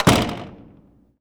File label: Metal Door Thick Close